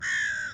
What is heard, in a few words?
crow caw